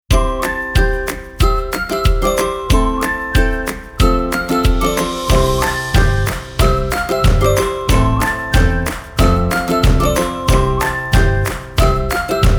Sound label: Ukulele Whistle